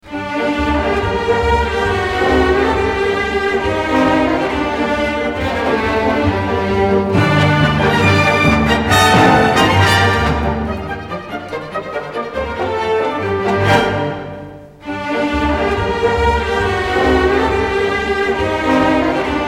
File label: Hungarian Dance, ringtones